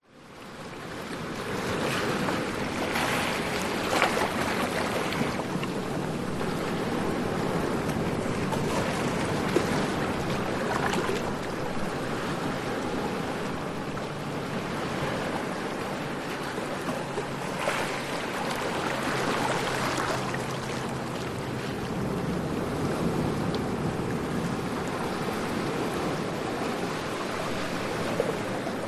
nature ocean waves medium splash rocks distance water rushes around rocks shallow; shallow close; zapsplat